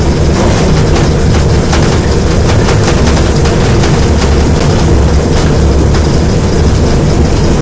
elevator move; elevatorparts

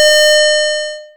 random chime

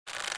ropes creak; dreambuilder modpack

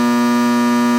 celevator drive run, elevator

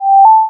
prospector hit; technic; dreambuilder modpack

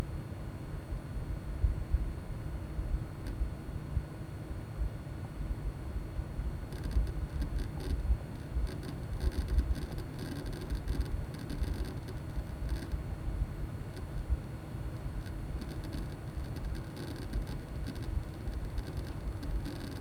hc650 activity bass cut

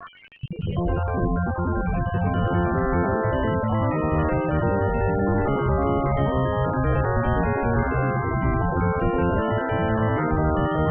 composite sine

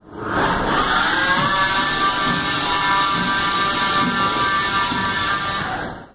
open, elevator; menards

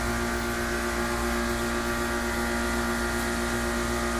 motor running hydraulic, elevator

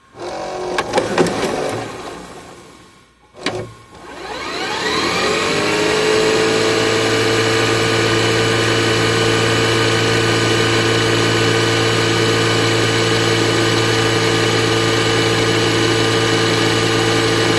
rewind

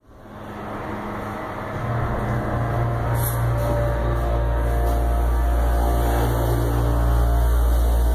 up start, elevator; menards

